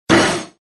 spoiled brat

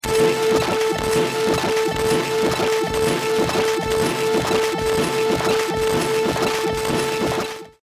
hi tray tone

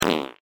cat fart

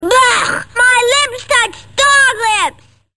dog lips

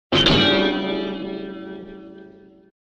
piano sprong

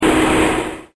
turret rot

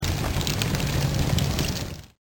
Fire

